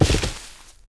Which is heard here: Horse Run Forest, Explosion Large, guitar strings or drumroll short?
Horse Run Forest